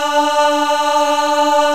MALE CHOIR

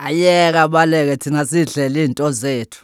Vocal Chirp, African Voices

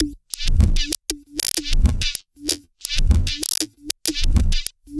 Structured Rhythm, IDM Rhythms

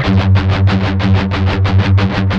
16TH G#2 L, Guitars; Spectrasonics; Hans Zimmer Guitars VOL-1; MED 16TH

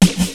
REVERSE DRUM; Rubber Chicken Software Sample Bank; DRUMS + PERC

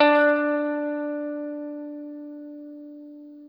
Guitar & Bass; Quantum Leap; 90's Sample CDs

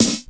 SD, SNARES; Crypton - DrumBase5000; 52 SNARES

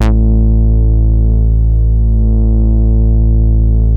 BOUNCE, BASSES

